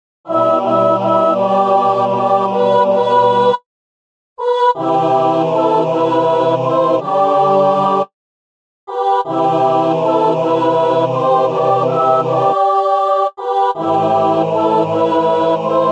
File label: SATB